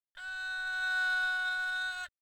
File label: haegeum; gugak